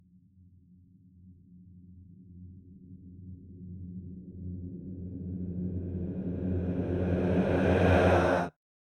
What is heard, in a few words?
Reverse Reverb